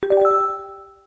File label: notify